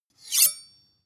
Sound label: sword blade grind short